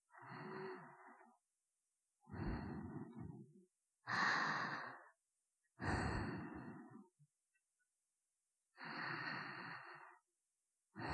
寝息(寝言入り)

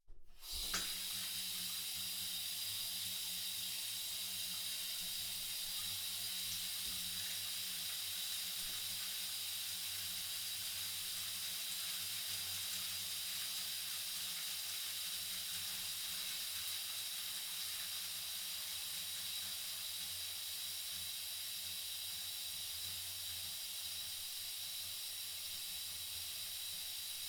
お風呂にお湯を入れる; 3DIO; お風呂に関する音

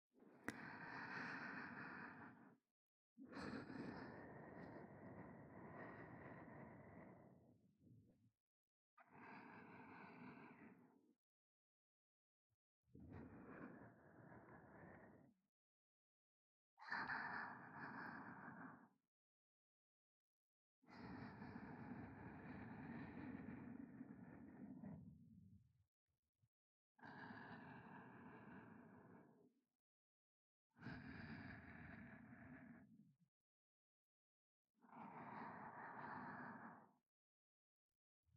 寝息（右）

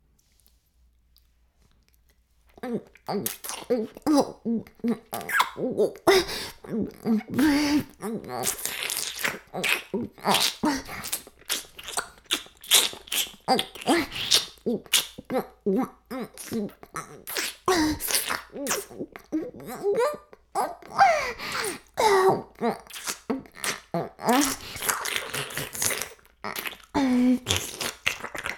フェラ音声, ASMR; 白くて黒い魔性円環

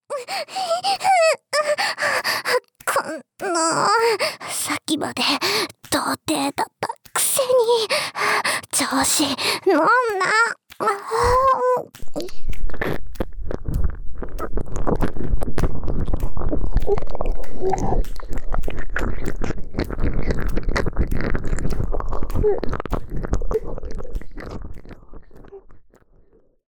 激しい セックス 喘ぎ 耳舐め; ギャルJKと援交えっち！?

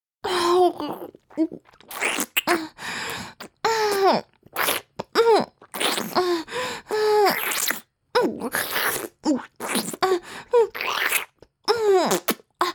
フェラチオ(強):頭を前後に振って速く陰茎をしゃぶる, チュパ音

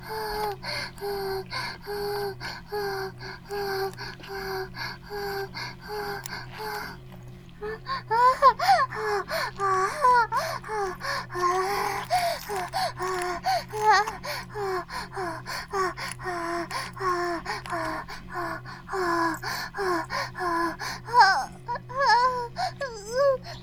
バイブ放置; イクたびにわけて

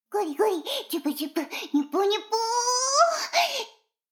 シコシコ音声; 逆時間停止されて

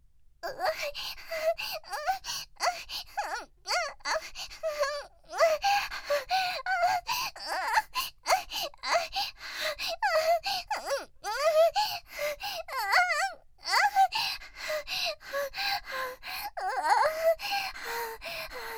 夢中になる喘ぎ

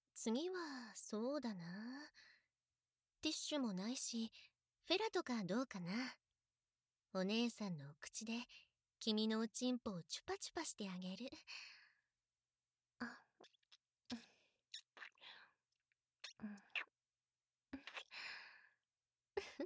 フェラ(SE)

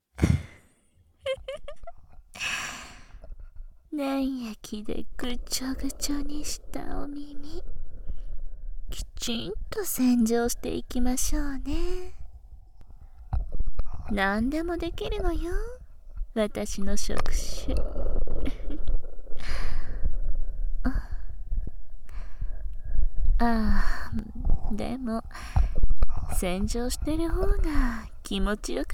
耳触手洗浄（バイノーラルマイク 効果音あり大きめ）, ASMR

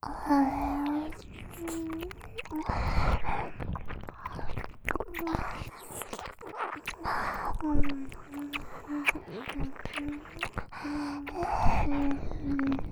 ひたすら耳舐め 激しめ, ASMR

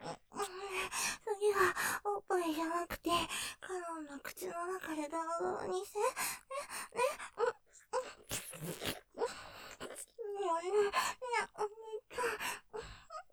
ふや声 どろどろにして; ステレオ

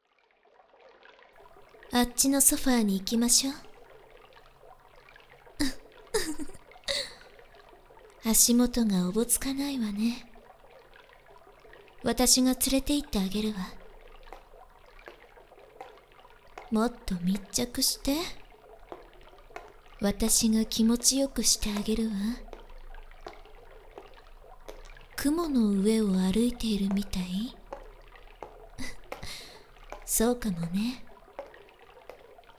淫乱ハード耳舐め; 女体化催眠ハードダイブ -女体化催眠音声; 耳舐め・スパンキング

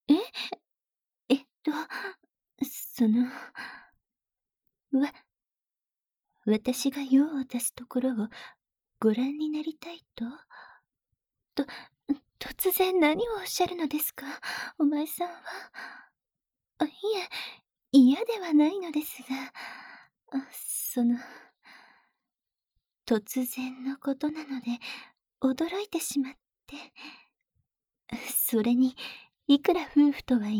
おしっこ; 雪女のお嫁さん弐～お前さんの望むままに、鈴の助平な穴でうんと悦ばせて差し上げますわ♪～; 普段の姿で; 効果音あり

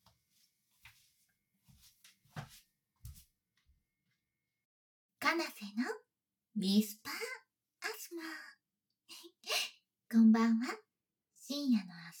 日常的ASMR♪食器を洗う音

